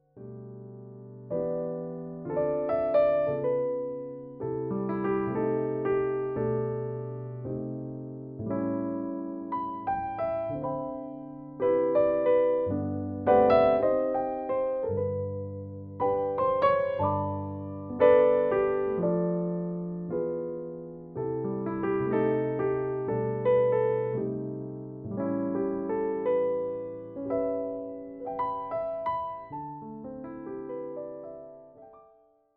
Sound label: Jazz sample